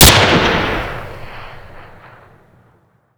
rpd; Modern Warfare

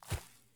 run grass low; Escape from Tarkov

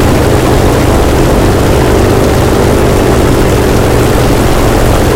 engine bomber close; engines; War Thunder

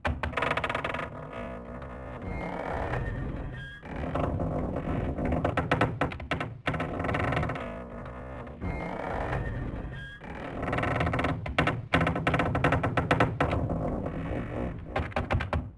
mill spinning; ambient; map malinovka; World of Tanks Blitz

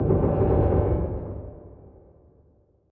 damage hits explosions; World Of Warships

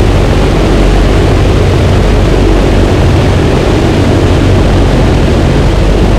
b17 rear, engines; War Thunder

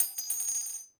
casing bounce hard

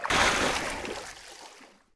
Wtr Oars Up; Civ4 warlords

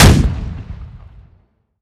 Cannon Mortar; Weapons; DCS

